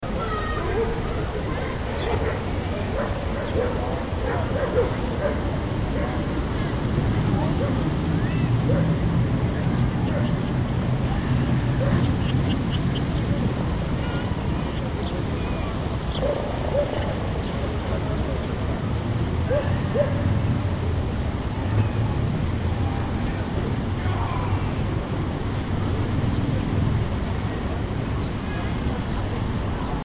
atmo germany berlin ferneshturm plaza west

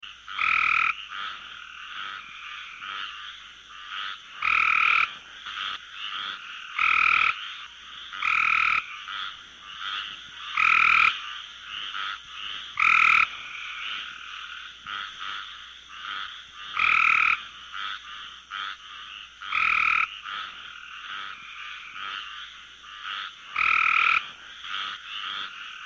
frogs florida gray tree frogs

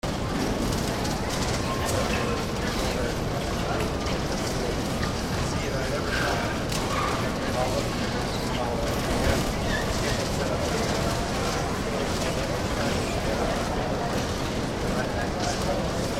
atmo airport baggage claim; john wayne airport

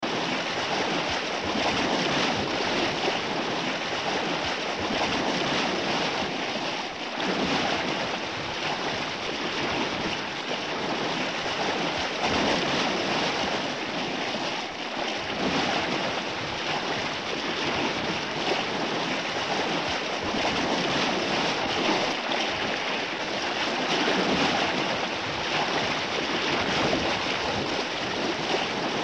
waves surging waves

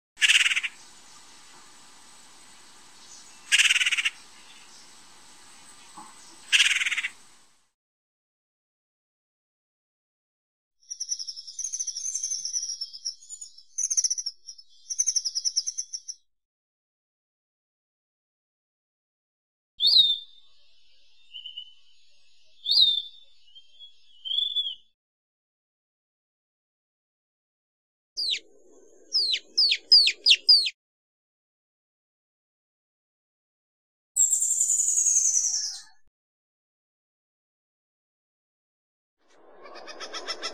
birds costa rica birds set

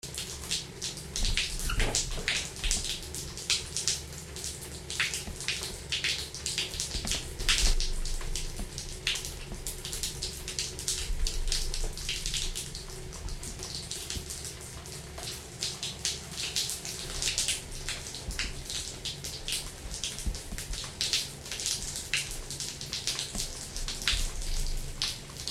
underworld metal pipes

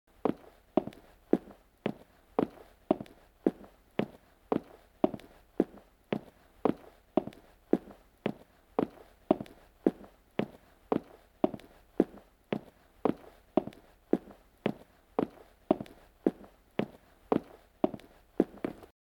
footsteps unisex wood

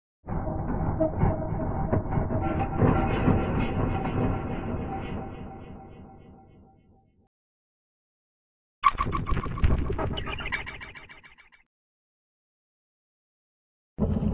scifi mechanics set

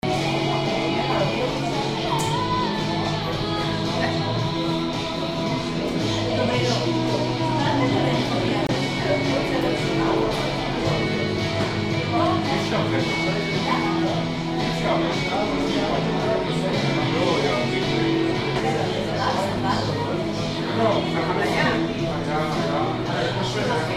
with music; germany berlin mcdowells

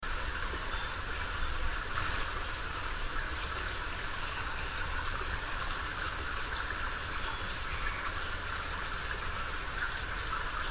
water small stream and bird chirps

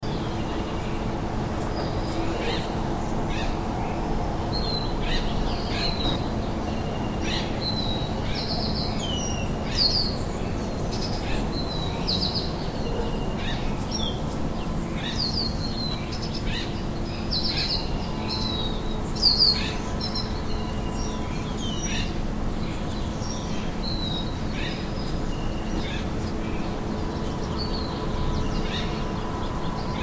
atmo forests az early morning

